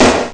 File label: 440 gated snare; ST-XX Amiga Soundtracker Sample Packs